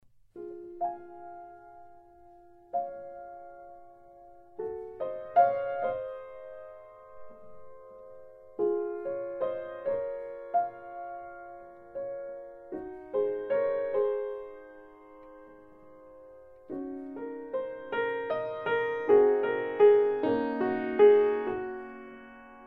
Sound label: Piano Gemini 5 FET